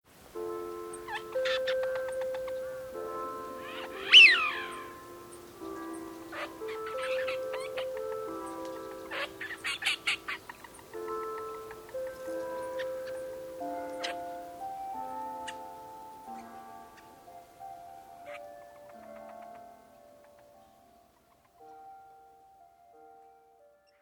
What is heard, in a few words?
time to gohome